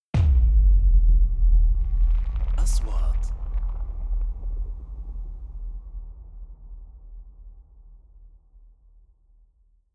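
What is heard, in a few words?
3D SFX Trailer; مؤثرات تشويقية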